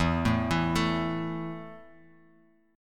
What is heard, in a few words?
A 5th E, guitar chord